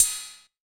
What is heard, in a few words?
HH, Drum Mashines; EdgeSounds